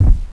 bulf Walk; NETSTORM

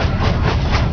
Steam Engine; ClipMedia™ Demo

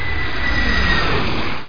jet short; Monster Media Number 15

